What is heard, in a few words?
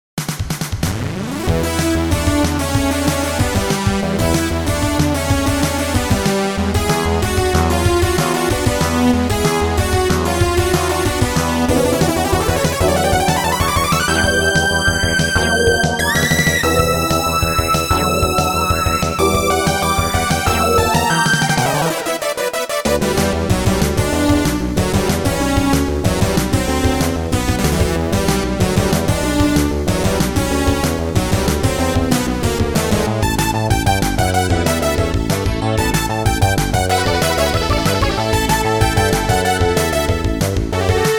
battle